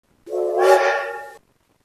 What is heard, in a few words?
Train